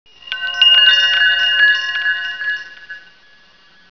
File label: chimes